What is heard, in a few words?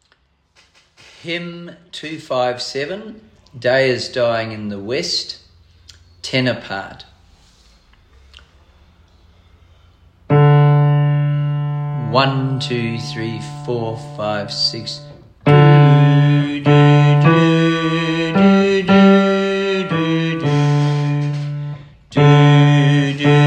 Tenor SATB, hymns; Day Is Dying in The West; Hymns for Jubilation; in house choir